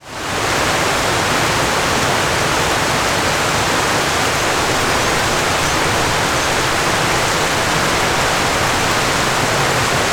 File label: nature waterfall at top water falling into creek below